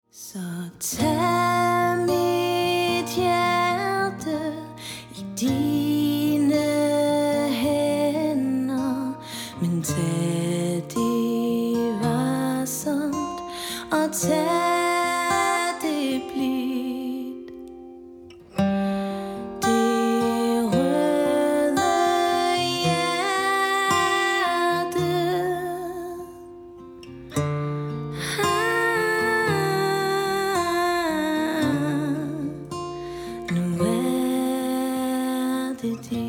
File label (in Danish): sangerinde med guitarist